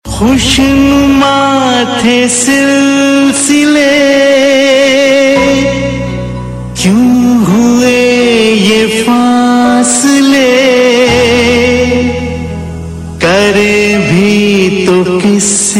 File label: Sad Male